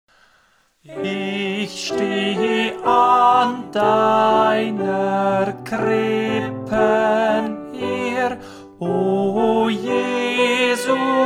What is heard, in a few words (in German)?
Sopran; Ich steh an deiner Krippen hier